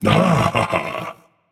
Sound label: nv discover ah; smite; voicepack; ganesha